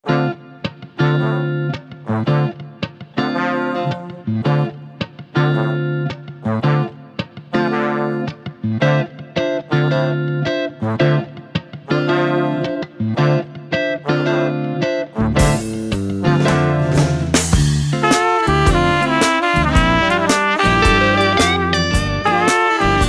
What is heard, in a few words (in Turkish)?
Güzel Hikaye (Saxophone)